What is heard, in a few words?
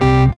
ORGAN A